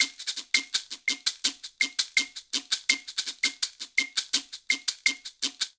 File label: Danzonete Maracas Guiro Claves 166bpm; Latin Percussion; Ableton; Factory Packs